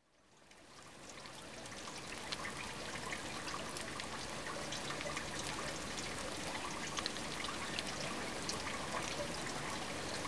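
techenie vodi v stochnuyu kanavu, water flowing into a drainage ditch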